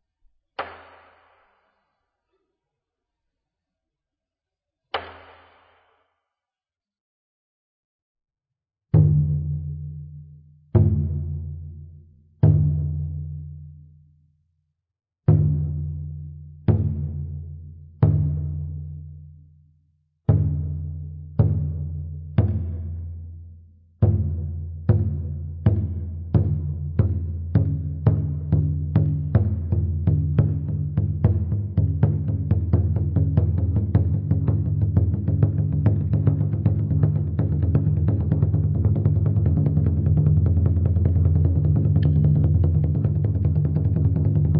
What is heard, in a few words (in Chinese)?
鼓再嚴